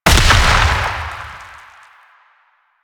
Proc Explosion Max; Mods

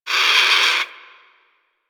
Tranq Dart Grunt; Warframe; Hunting Critters; Avichaea

